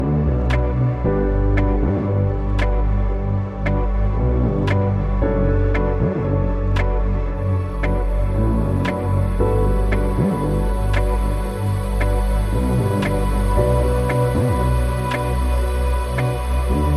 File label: Sundance, No Drums